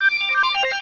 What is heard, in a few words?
Multi Attack; pokerogue; battle anims